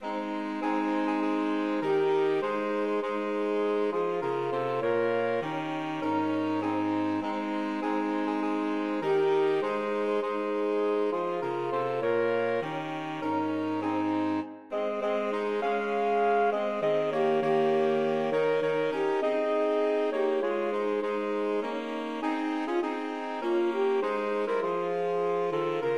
christmas tree SAX quart

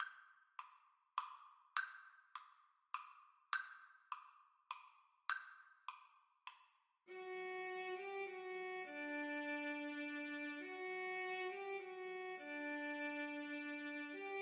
Violin Duet

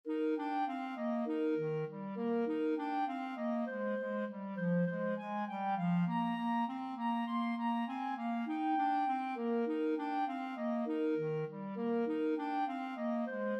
clarinet duet

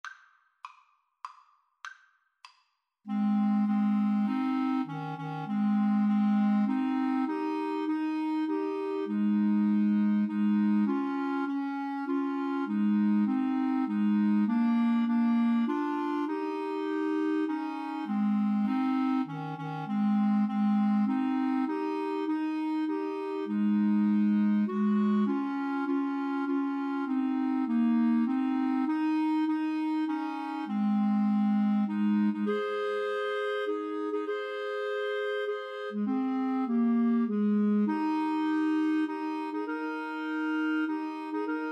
Clarinet Trio

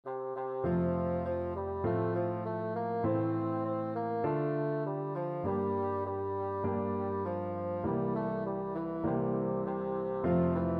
simple gifts, bassoon

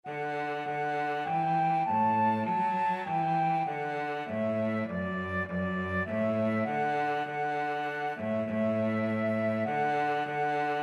flute cello, flute-cello duet

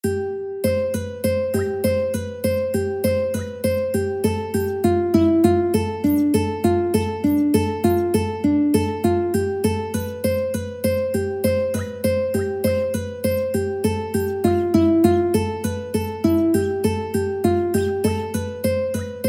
ukulele

